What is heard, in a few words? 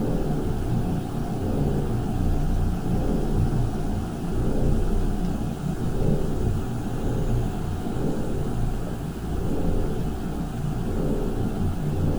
ship transport ship idle; Stellaris; machineage